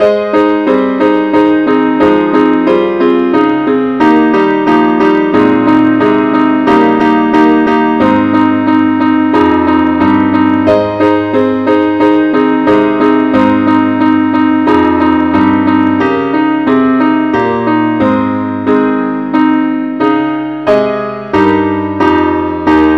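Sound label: keyboard only key A flat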